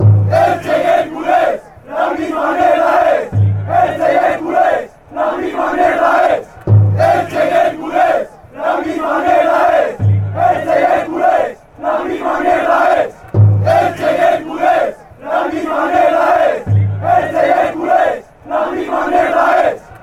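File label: fanchants, football chants; elche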